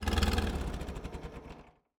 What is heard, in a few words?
vehicle tractor turnoff